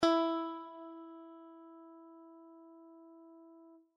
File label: guitar e; tuning fork